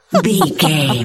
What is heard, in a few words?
voice female laugh